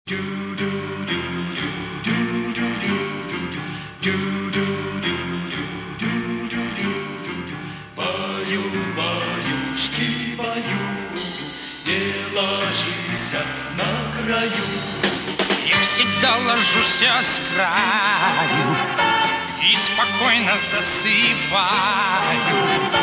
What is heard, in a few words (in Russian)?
bau baushki bau